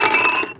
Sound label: Moody Park Chimes Perc; Houston